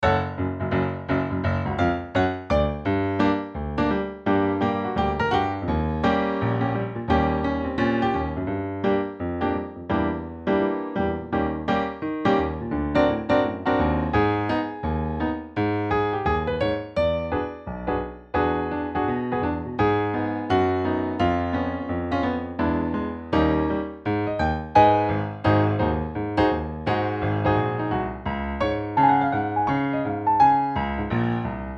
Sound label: Piano; Copa; Singing Audition Pieces